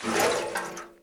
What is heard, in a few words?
gas can inspect movement; tf2